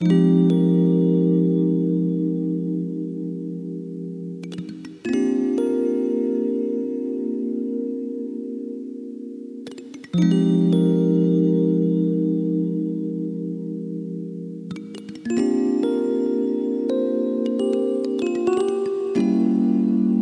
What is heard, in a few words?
Chillout Vibes